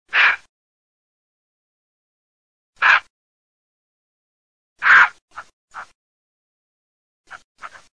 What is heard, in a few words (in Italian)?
capriolo